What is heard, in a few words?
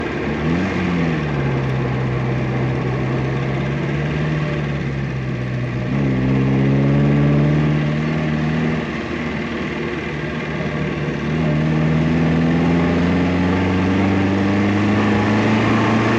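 Car Riley Drive Constant Interior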